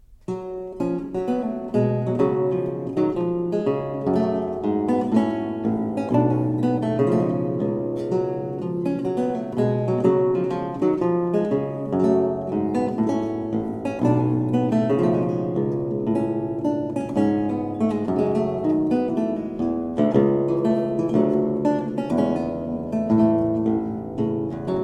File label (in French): Gavotte Sol Mineur, luth baroque; Robert de Visee